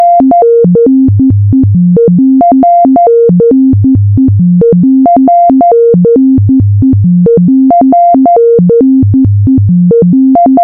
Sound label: self rez melody; modular demos